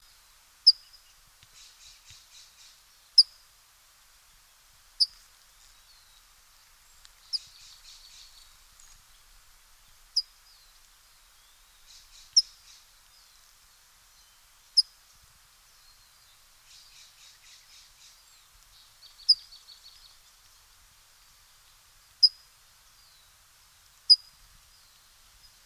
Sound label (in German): Kaliforniengrundammer Melozone crissalis